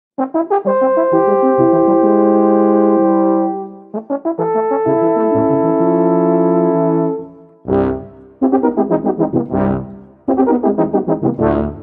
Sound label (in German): Tuba Quartett